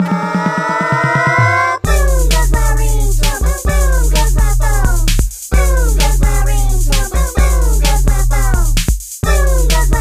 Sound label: Ring Tone